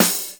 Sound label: normal hit clap